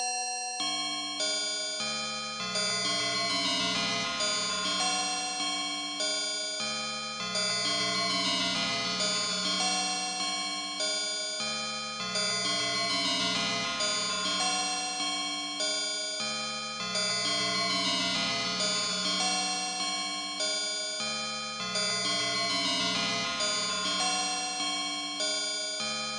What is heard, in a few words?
bell ringtone